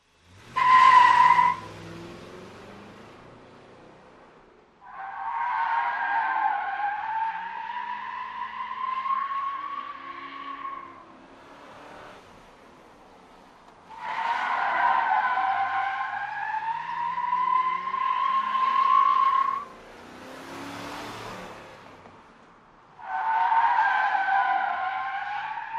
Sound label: Car Skids Multiple L